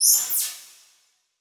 Title Transition Laser Short; Adobe; Production Element

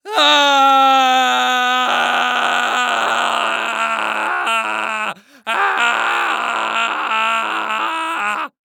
Human Male Yell Long Frustrated Yell

